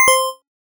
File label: 8bit status point; Retro Games; SoundHolder